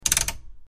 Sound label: Rotary Dial Turns, switch clicks